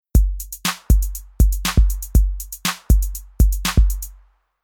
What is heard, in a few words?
Rhythm Electro